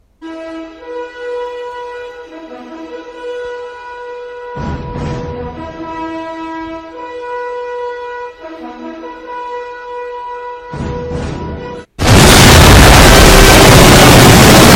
intro bass boost; universal

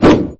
fire

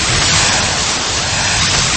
force lightning sound; lego star wars

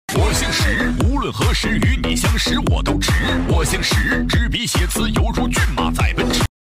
chinese music dance

